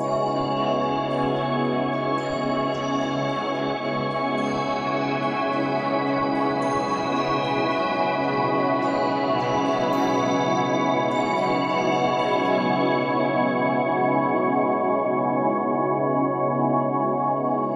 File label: bells add amplitude group delay; zynaddsubfx